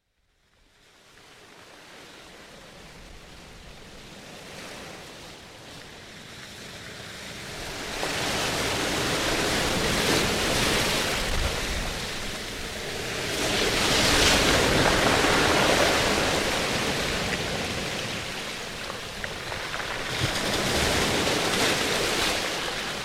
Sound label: Ocean Waves at Sunset; Nature Sounds; Relax With Nature Vol. 1